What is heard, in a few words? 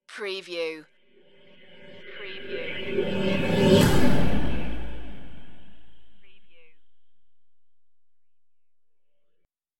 rising pulse hit